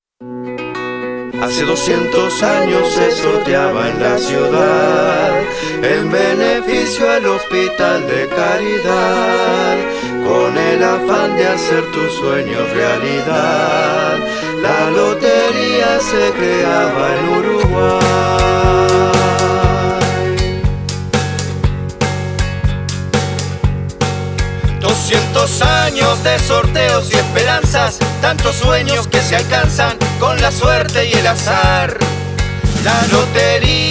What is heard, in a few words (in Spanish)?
Jingle Completo; LOTERIAS